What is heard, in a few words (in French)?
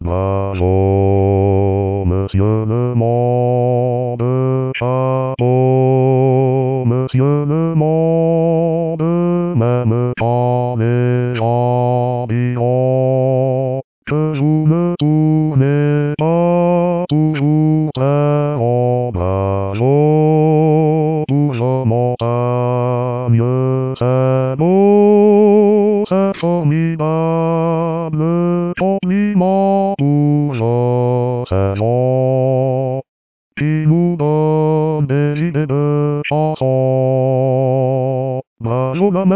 Basse